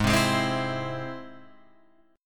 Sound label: G sharp Augmented G sharp Guitar Open F; G#+ strummed; JGuitar